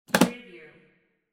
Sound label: briefcase lock